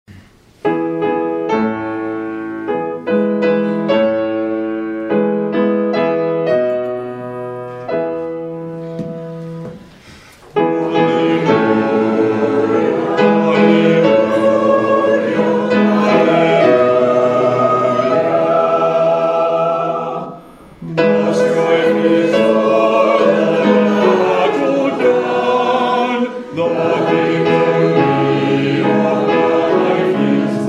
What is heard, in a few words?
Hymn; The strife is o'er